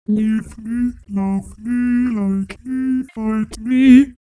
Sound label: formant shift